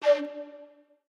quick Stop; temple of nudges

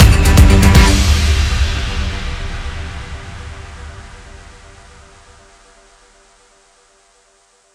bigwinend; cashnoire

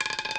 center Roll Long, roulette; americanroulette3

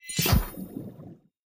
symbol remove land; reef raider

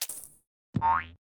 crab Jump Land; reef raider

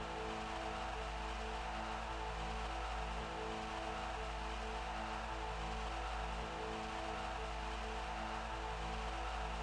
reel spin autoplay; wild water

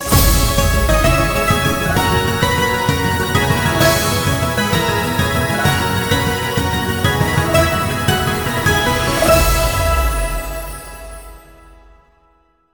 mega win; turn your fortune

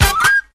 scatter On Reel; scruffy duck

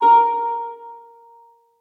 melody Bb4; fairymirror

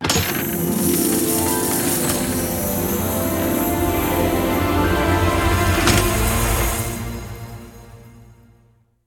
pick And Click Coin; fairyhansel

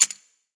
stack Chip; roulette

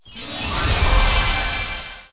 random Symbol Transform; fairyhansel

